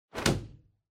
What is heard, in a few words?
Motion Slam Gentle